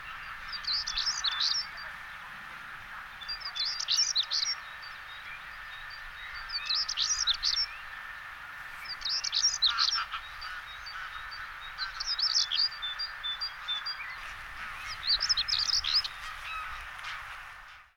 schwarzkehlchen

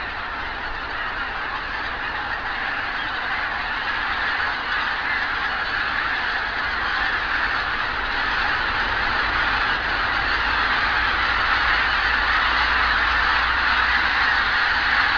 gaense

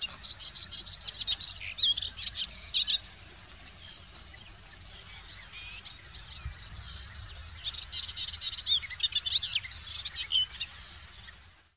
orpheusspoetter

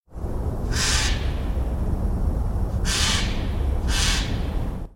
eichelhaeher

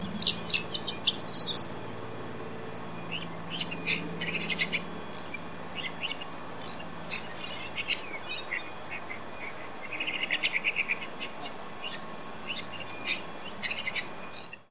neuntoeter

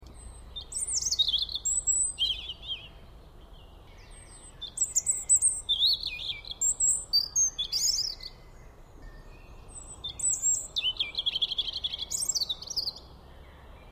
rotkehlchen; Erithacus rubecula; Rotkehlchen Nachtigall

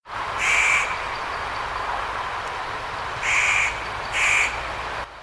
tannenhaeher

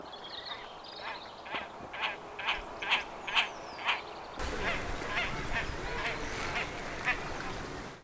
haubentaucher

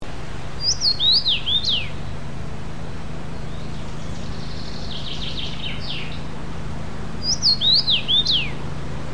karmingimpel

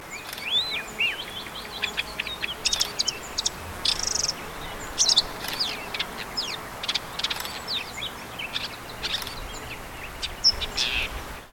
rotkopfwuerger

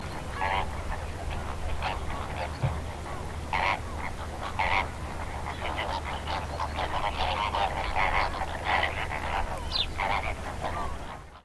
rosaflamingo

